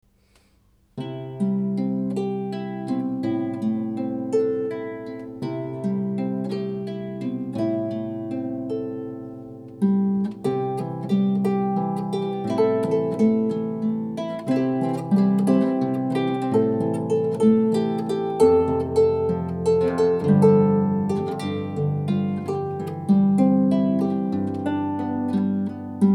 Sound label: harp; solo